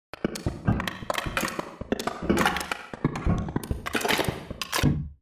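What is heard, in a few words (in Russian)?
высыпают кучу жестяных банок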